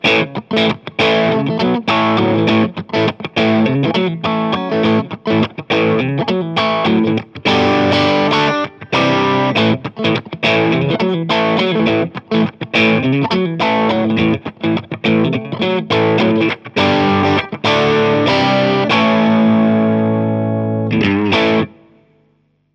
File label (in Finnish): clean strato keski ja talla od3; dsl401